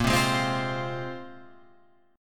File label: Bb 7th Suspended 2nd Bb down Guitar Open F; Bb7sus2 strummed; JGuitar